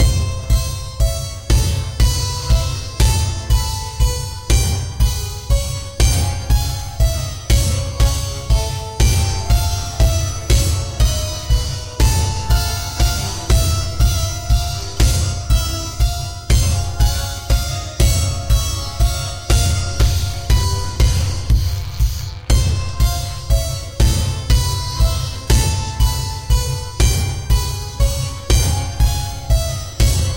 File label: baroque zombie trance dance music with drums and harpsichord 60bpm; lots of tom tom